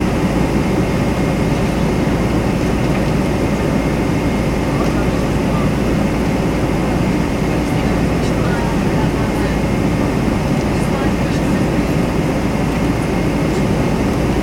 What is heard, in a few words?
aircraft commercial boeing 747 400 interior; transportation; sound effects; sound effects one